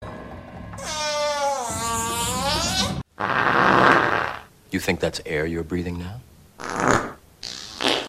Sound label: Farts; Matrix